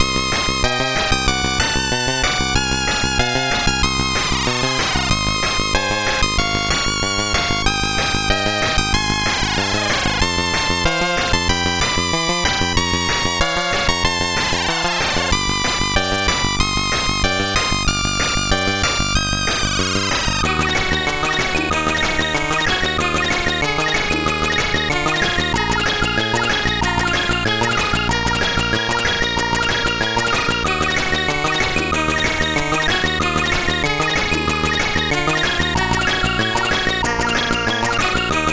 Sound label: Synth Sample, CSG8580R5; Final Synth Sample II